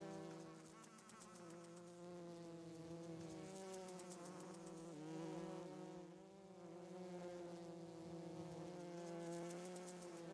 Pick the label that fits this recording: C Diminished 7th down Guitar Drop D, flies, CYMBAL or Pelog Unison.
flies